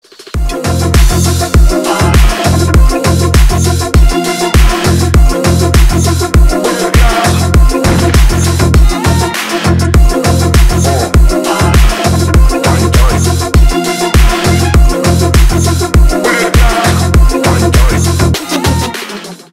glock; edm

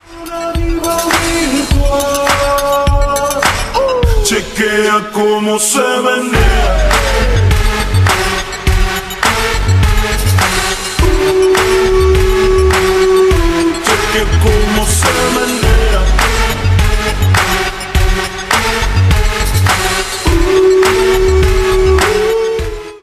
slowed reverb

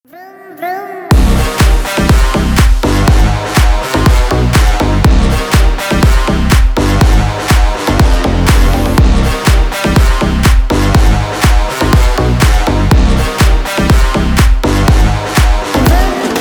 vroom vroom

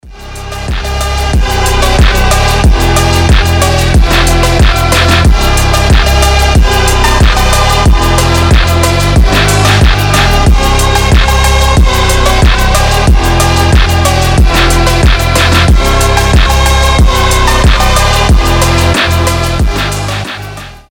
trap

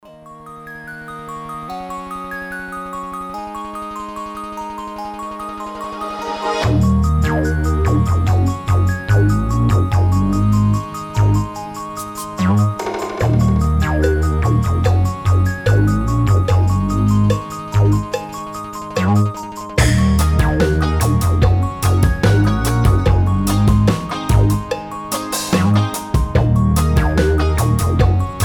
slow beat; биты

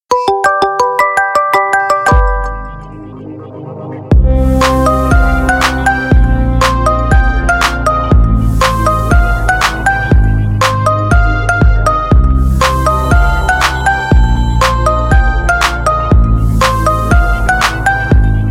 theme song marimba remix; among us; маримба

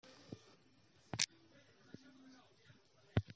klik; soobshcheniia sms

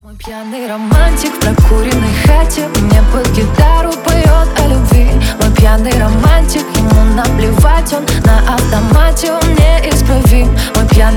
remix

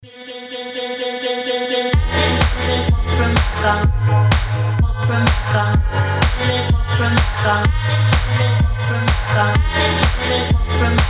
trap

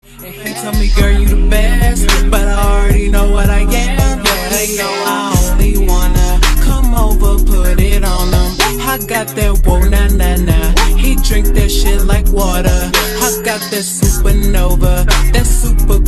slowed reverb; хип-хоп

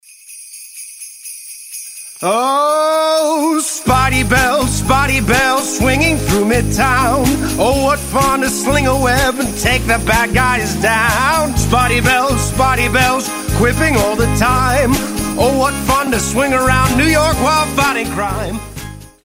spidey bells

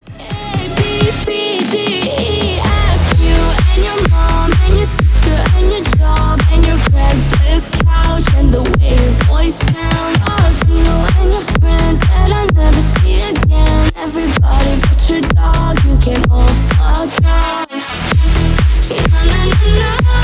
rock

